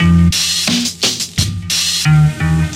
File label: full boom bap